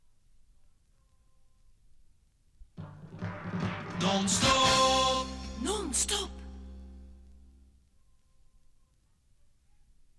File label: topformat maxi jingle single